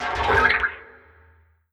vent journey, headcrab classic; headcrab classic, scripted; creatures

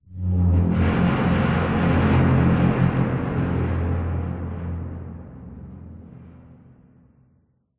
amb apocalypse wronk, ambient city

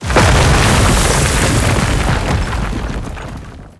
dig down, antlion; creatures

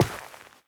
step light, footsteps, player, carpet

